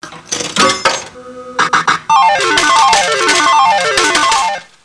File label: slot; Easy Phonics